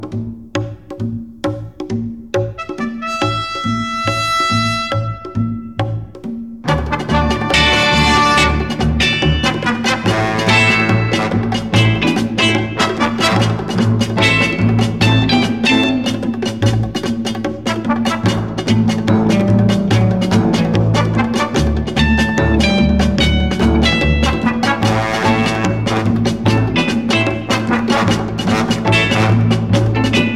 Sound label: theme; thePrisoner